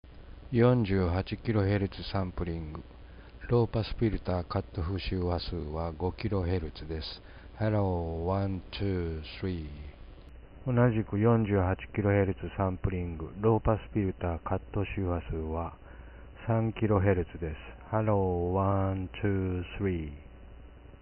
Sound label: test LPF